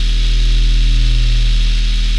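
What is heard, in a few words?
force field door; Star Wars Knights Of The Old Republic II; XBOX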